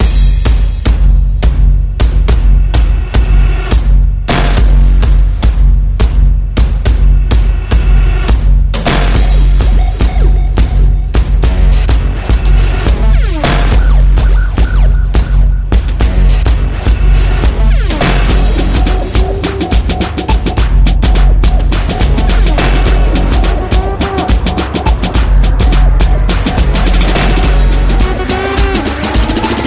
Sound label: Hip hop